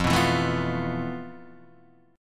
F Major 7th Flat 5th Guitar DADGAD

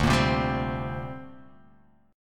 D 7th Suspended 4th Sharp 5th, down

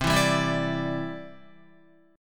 C Major Add 9th, Guitar, Cadd9 chord; strummed; JGuitar

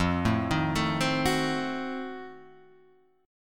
F 7th Flat 9th F; F7b9 Chord {1 0 1 2 1 2} arpeggiated

